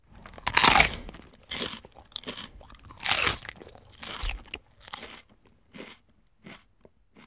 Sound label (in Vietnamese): Tieng an dua chuot gion tuoi